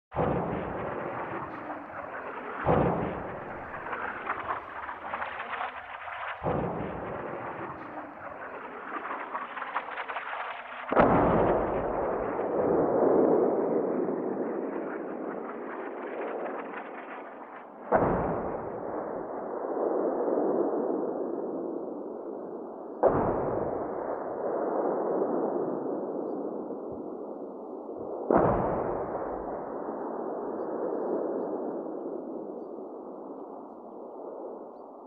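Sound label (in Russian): howitzers firing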